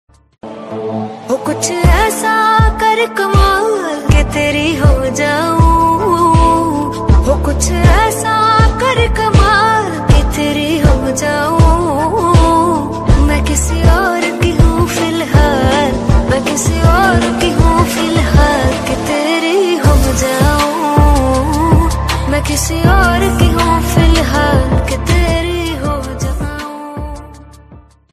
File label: new ringtone hindi